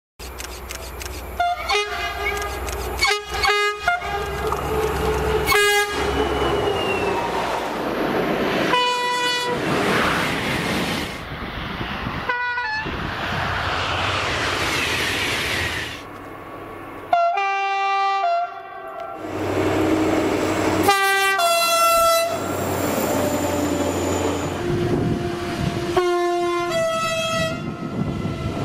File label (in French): Compilation de klaxons de trains